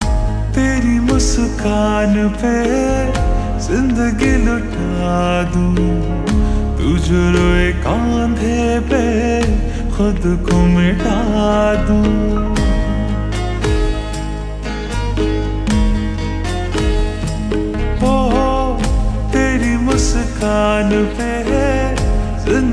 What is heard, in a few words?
Slow Reverb Version Ringtone